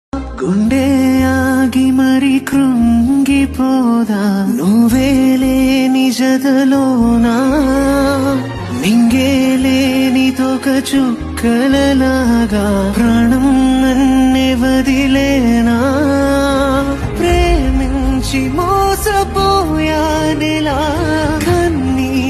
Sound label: movie ringtone bgm ringtones; baby movie